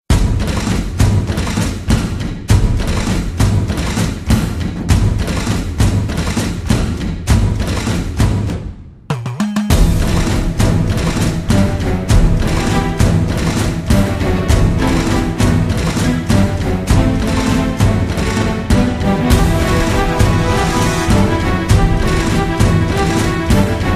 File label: Theme Music; 3 hindi 2012